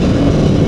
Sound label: door move